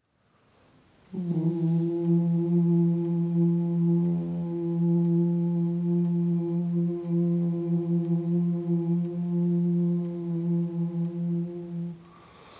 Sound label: Wexford Bass